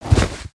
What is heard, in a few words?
Pet Doberman Bound; creatures pets